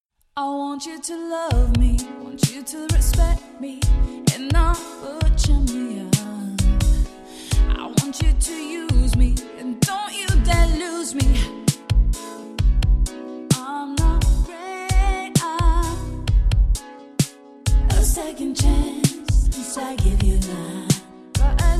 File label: vocally 432 hz